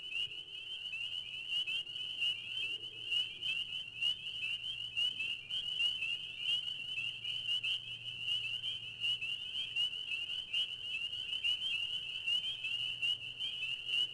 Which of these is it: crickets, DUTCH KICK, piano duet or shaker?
crickets